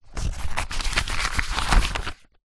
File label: household colouring book page turn